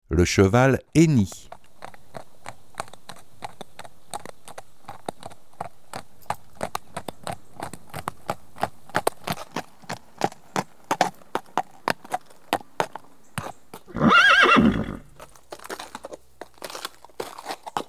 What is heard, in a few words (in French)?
le cheval; Mon imagier sonore de la nature